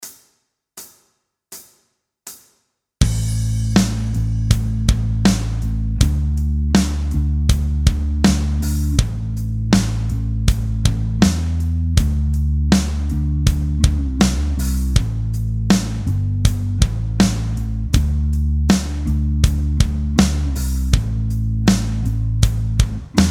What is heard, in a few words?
Backing Track; guitar chord mastery